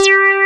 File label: JP6 mouth; E-MU Formula 4000 Series Vol. 3 – Analog Odyssey; VK+ Instruments